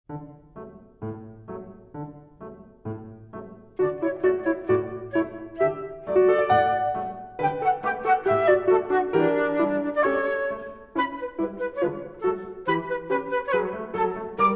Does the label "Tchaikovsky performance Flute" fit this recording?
Yes